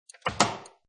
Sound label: Domestic Door Wood Door Close; Doors; Domestic Door, Wood Door Close 01; Miscellaneous Doors; Lynne Music